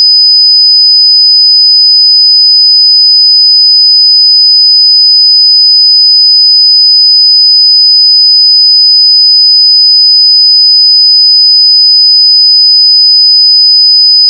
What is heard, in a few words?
sine 5khz